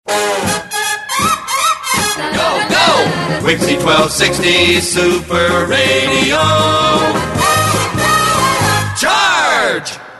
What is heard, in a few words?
CHARGE!